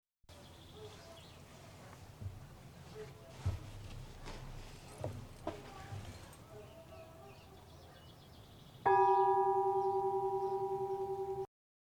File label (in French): cloche; St Sion de Pélouaille